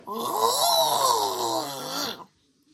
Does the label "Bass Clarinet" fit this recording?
No